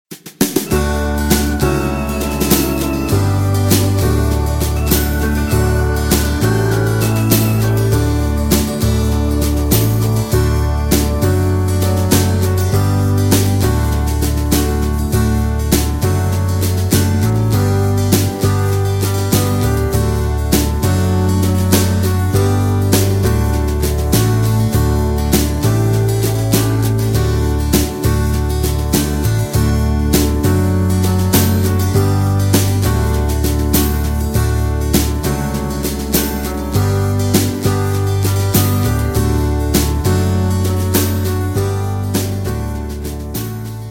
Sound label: D No Backing Vocals, backing tracks